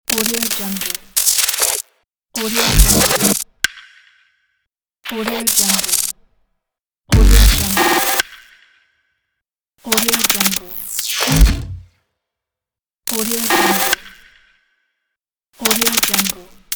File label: glitch